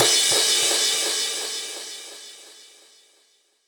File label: crash; osu